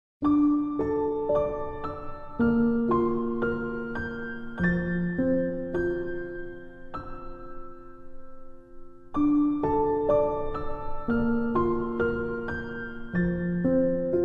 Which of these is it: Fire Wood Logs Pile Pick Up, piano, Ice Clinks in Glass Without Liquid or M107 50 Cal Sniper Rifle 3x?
piano